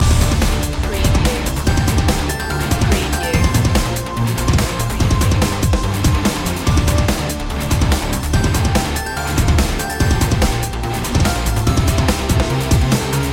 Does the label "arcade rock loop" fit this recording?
Yes